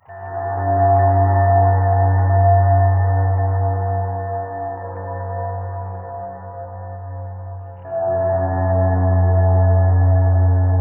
dark, ambience music

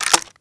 xbow ready round

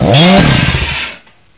chainsaw hit wall

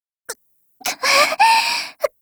m14 bar pull

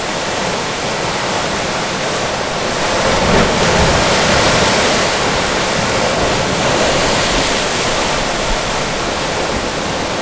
sea ice loop

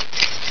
key sound

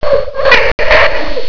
die; snapbug

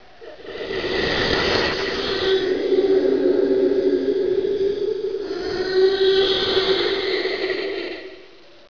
wispers voices

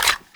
glock mag out

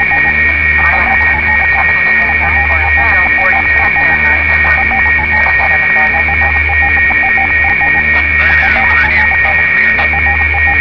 signal gear

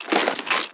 ammo pickup; scmod

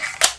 gl insert shell

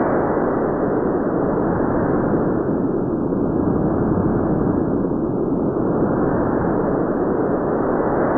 wind outdoor

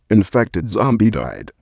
shh

